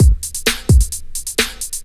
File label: drt 130 electro, DRUMLOOPS, LOOP ELECTRO; Ueberschall; Techno Trance Essentials